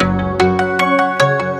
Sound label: Arp, Keyboards